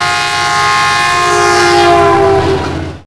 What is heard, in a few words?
truck horn; Max Payne; tenements